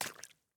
water land; teardown; ps5 windows xbox series xs

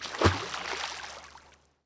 water splash

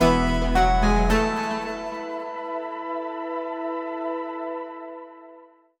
Stinger; jenny leclue detectiv

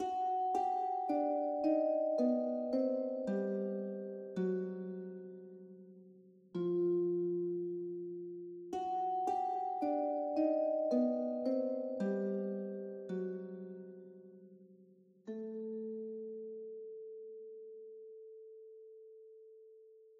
Vibes; Sarco Stealth Rnd; Tintin Reporter - Cigars of the Pharaoh

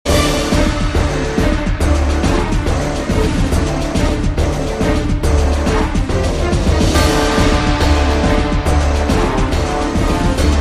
Boss Gun Ship; Iron Man DS PS2 PS3 PSP Wii Xbox gamerip 2008; Boss Stark Gun Ship

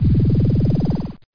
LASER; Real War Air Land Sea

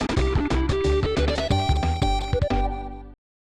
Stage Clear; Donkey Kong Country 3; SNES; Mountain

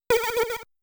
Sorcerer Spell Cast

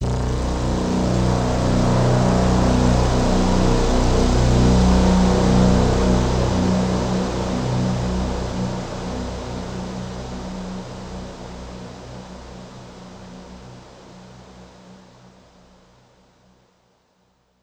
Overlay Drone; Act2 Anchor

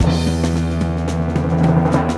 Logboat Fill; when vikings attack

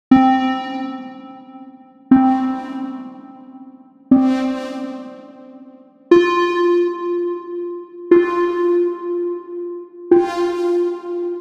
collisions synth velocity

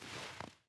snow step

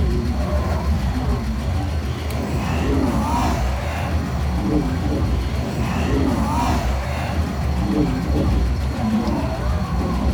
Washing Machine Lp; Rabbids Coding

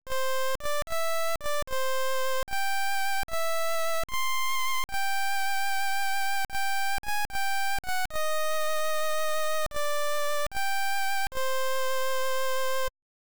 Ocarina; legend of zelda the link s awakening 1993 gb

